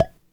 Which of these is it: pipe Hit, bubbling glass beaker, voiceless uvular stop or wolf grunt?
pipe Hit